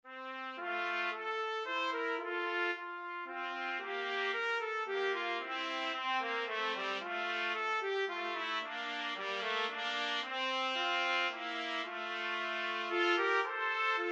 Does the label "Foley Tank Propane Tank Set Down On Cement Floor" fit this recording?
No